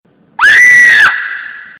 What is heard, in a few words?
Shout Scream